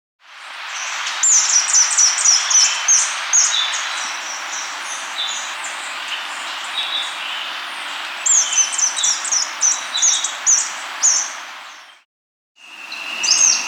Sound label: Variegated Bristle-Tyrant (Phylloscartes Poecilotis); S. America; Andean Birds; Natures Songs